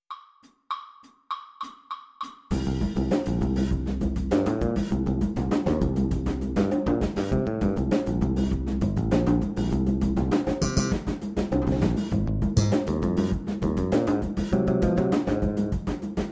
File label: Piano Playalong FUNK; Piano Etude A-2 Funk Track